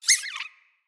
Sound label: Floppet Single Squeak, Animals, Vocals